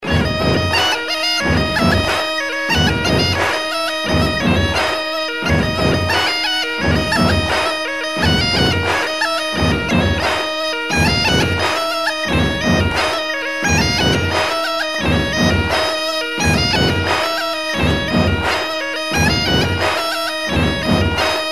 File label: Gaida Sound